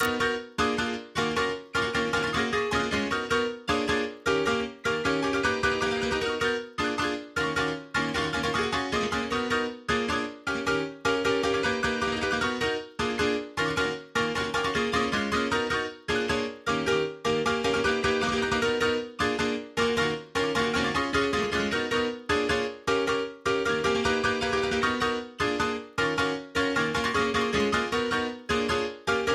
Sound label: Drop Synth WET; Drop Synth 1 WET NO SC